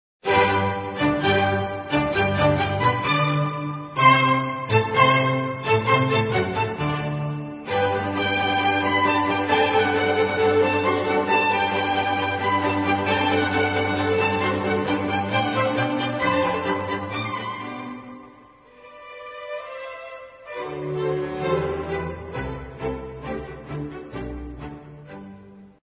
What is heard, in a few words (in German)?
Eine Kleine Nachtmusik Kv 525